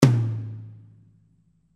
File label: rack, drums